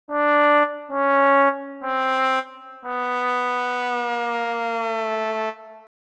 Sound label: failure trombone